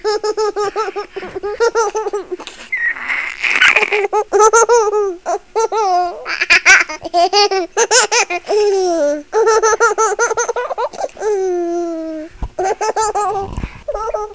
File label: Laughter